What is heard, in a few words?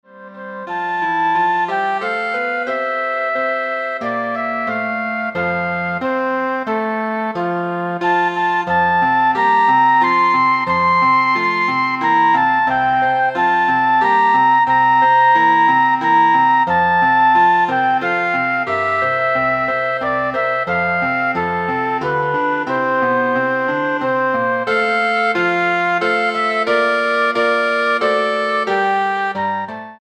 Flute Clarinet